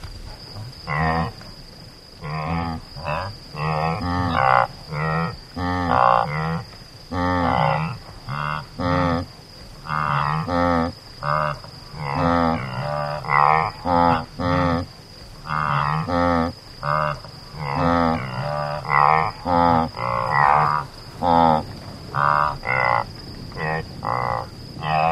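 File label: antelope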